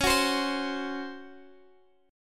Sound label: Db Major 7th Suspended 4th, Guitar, Open G